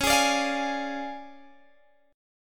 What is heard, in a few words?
D Minor Major 7th Flat 5th C sharp, guitar